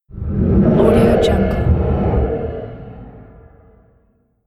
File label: shifting evil